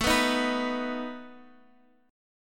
A Suspended 2nd Flat 5th A down Guitar Half Step Down